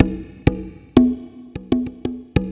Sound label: congas